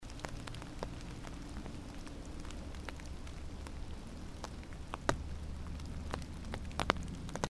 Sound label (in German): Eimert, Rain; Umbrella; Kleine Schweiz/Velbert; Christoph Collenberg